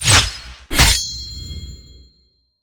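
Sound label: spawn; karajan; thedead3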